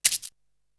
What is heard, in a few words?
Shake, Drums; ZERO G - Datafile DISC 3